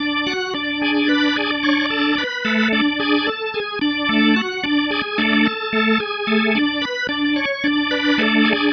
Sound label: Psychedelic, Organ, Loops